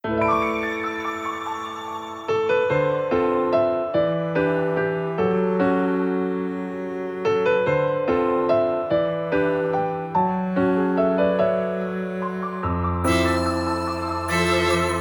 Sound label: Snowy Day loop; PerituneMaterial Snowy Day3; Solenar's Edge II Champions